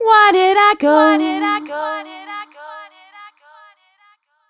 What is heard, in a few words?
f mel a flat, fem Vocals